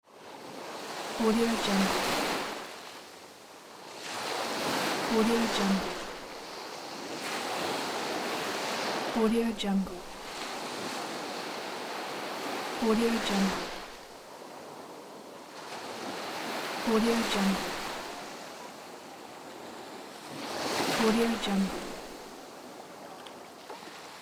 beach wave ambience

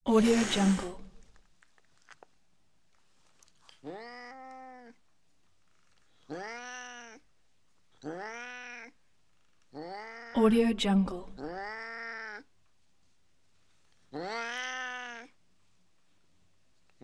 the cat hisses and growls

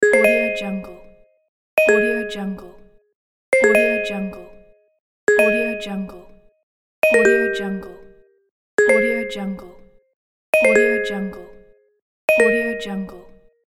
alert

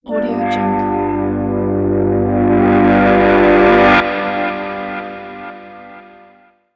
electric logo

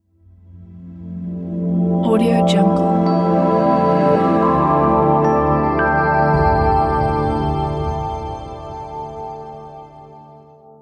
clean elegant logo reveal

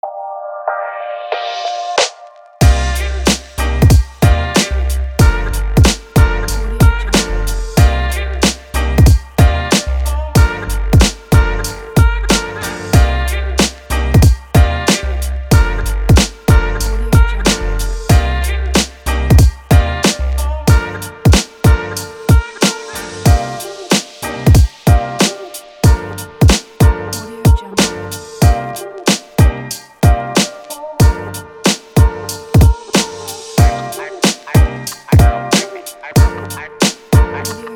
hiphop

